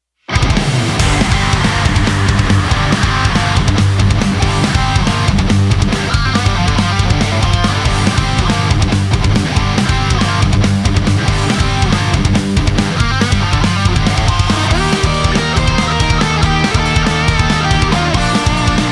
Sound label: BGM Hard Rock 140